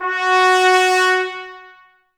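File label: BRS trumpets F#; 90 s Sample CDs; Miroslav Vitous; Woodwind Brass Ensemble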